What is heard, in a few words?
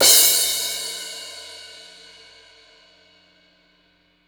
crash; East Collexion; Drum 1 Dry